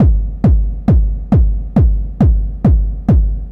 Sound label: Kick Loop, Drums, Dance Beats